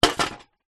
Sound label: household bucket metal old rusty set down concrete; zapsplat